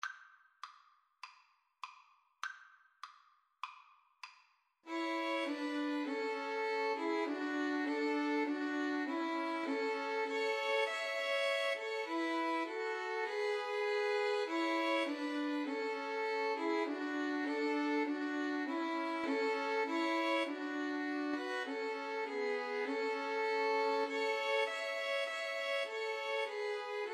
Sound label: 2 Violins Cello